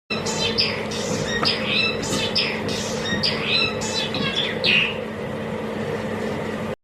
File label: Rüppell's warbler; سسک گلو سیاه